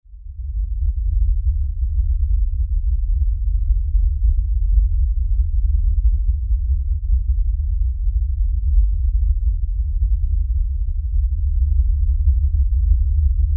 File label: LFE Rumble, Lfe Rumble Low Bass; Rumbles; Blastwave; Sound Effects:Sound Design